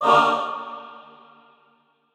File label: chor